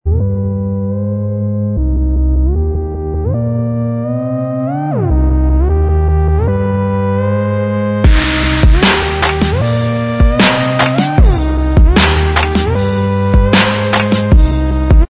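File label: instrumental, backing track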